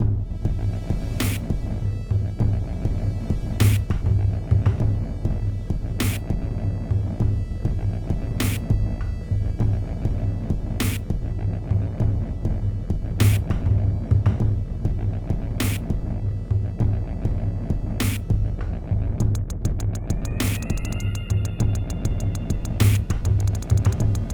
Music Gameplay State Neutral; Music Hideout1; H1 Level Area=Building2 1st Part

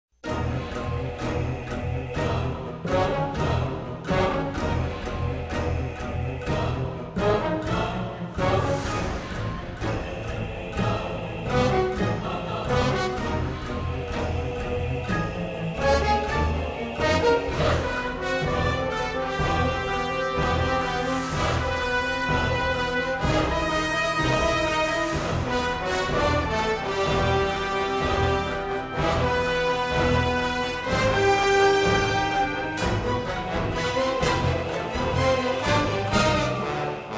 Klingon Mission Success; Star Trek Starfleet Command; windows

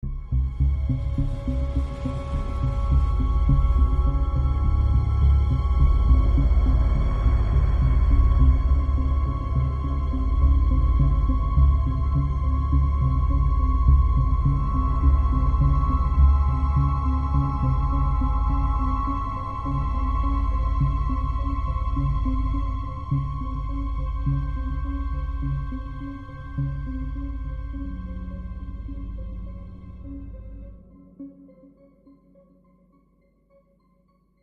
Linear Tense; the walking dead season one

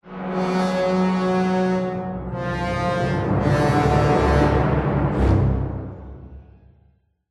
Reveal Tank; Cannery

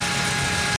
MINIGUN MOTOR; blockade classic

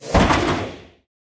Big Door Open; Lethal Company

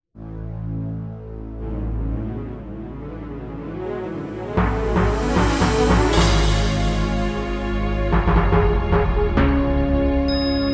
Fanfare; King's Quest 5; Sierra Fanfare

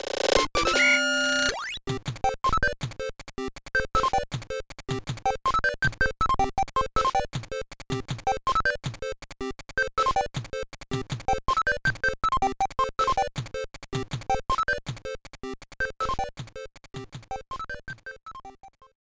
BGM

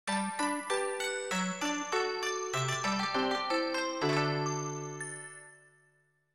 After Interval Event Jingle; inuyasha secret of the cursed mask

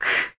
Poke Belly; talking tom cat 2 android ios online gamerip 2011

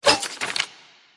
Click

